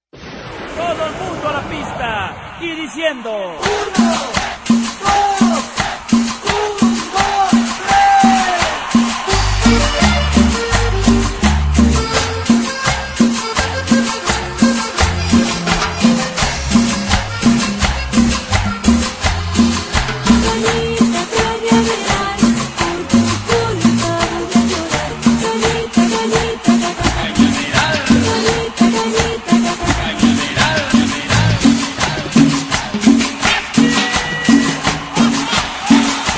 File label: cumbia mix, cumbias sonideras mix